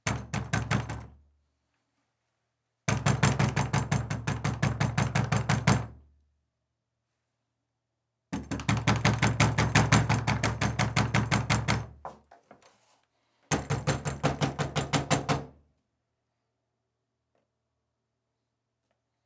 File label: Loud Knocking Banging Interior Room Door